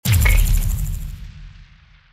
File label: boom